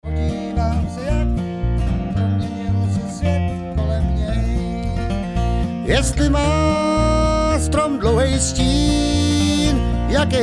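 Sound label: vocal Lead, bluegrass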